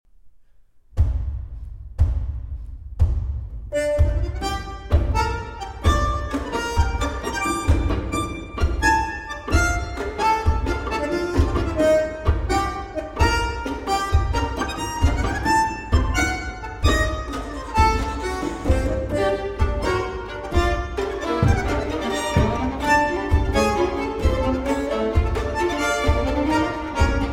bandoneon, violin and orchestra

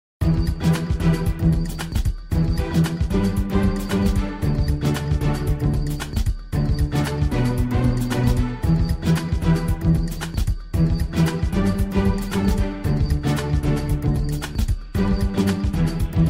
Steampunk Music Instrumental

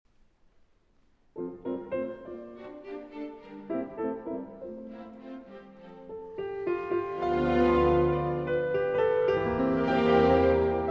Piano Concerto in A Minor; II. Intermezzo; Op. 54; Schumann

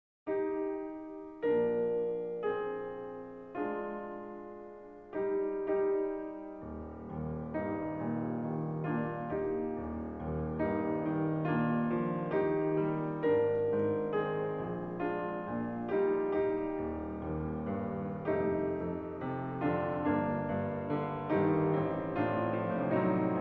Violin Sonata in G Major, Adagio; Brahms; Violin Sonata No. 1; Op. 78

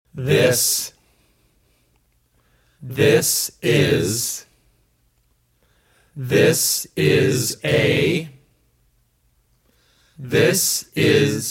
Percussion

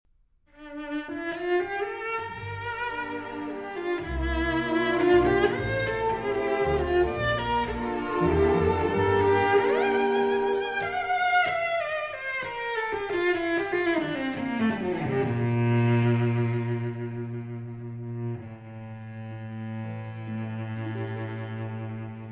For Cello and Orchestra

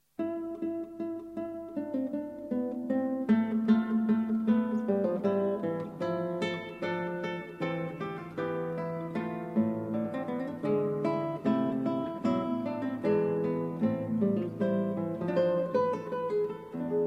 Fugue From Violin Sonata No. 1

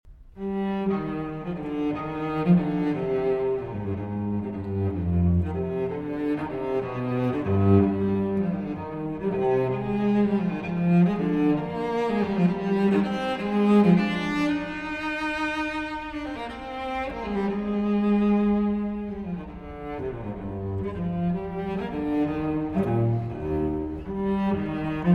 Cello Suite No. 5 in C Minor, Gigue; BWV 1011

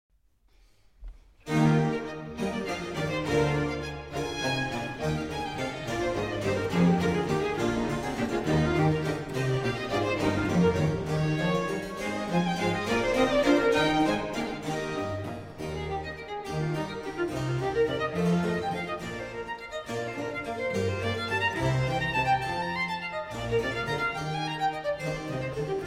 Violin Concerto in E Major, Allegro assai; BWV 1042

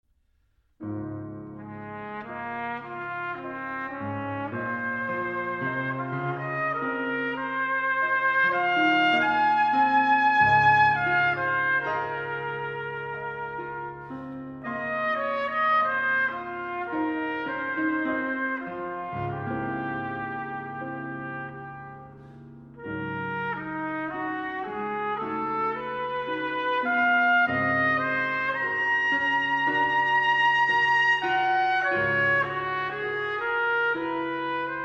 for Trumpet and Piano, Largo; Tomasi Triptyque

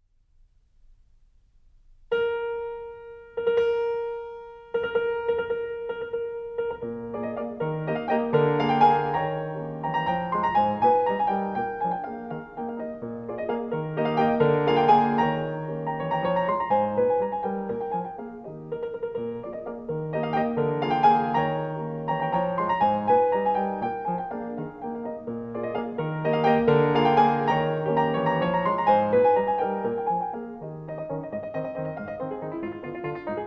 Grande Valse Brillante in E-Flat Major; Augustin Anievas; Op. 18

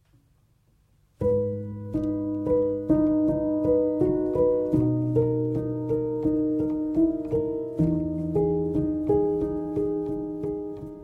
Piano

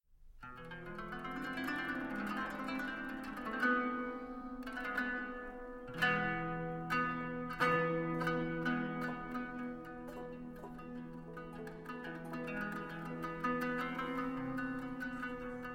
Taksim Kanun, Vièle, Oud, Kemence et Tanbur

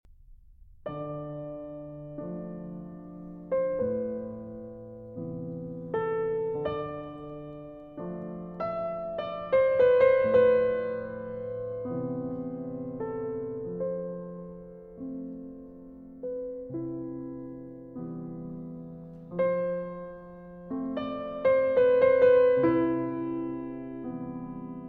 Pieces for Piano; Six Pieces for Piano, Volume 2

